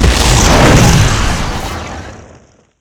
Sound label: firewall; SPELLS